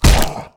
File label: Damage